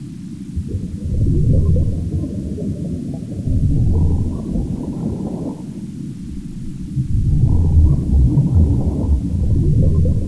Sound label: underwater; poseidon